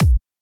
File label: kick; osu